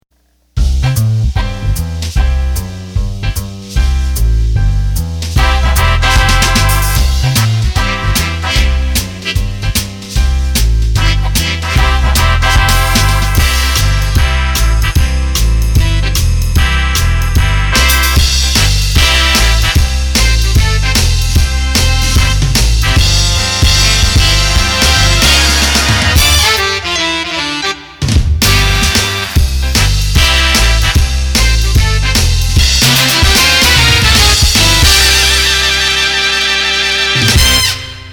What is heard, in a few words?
Swing, accordion; roland bk7m